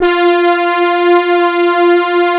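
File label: synthesizer, digital; Sound & Vision - Gigapack I CD 2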